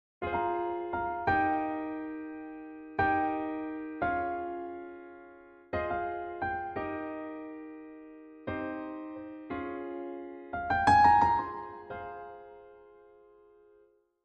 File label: Piano